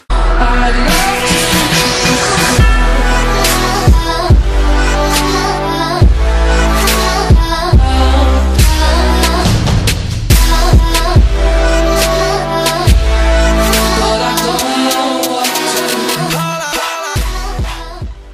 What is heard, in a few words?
English Remix Ringtone